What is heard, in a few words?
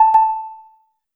match confirm